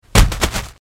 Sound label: Impacts On Bubble Wra; bubble wrap